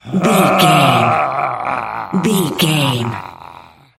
voice male growl

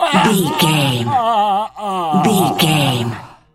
voice male death torture

